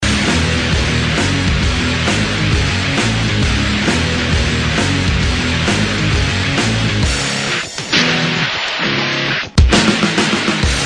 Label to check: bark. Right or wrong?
Wrong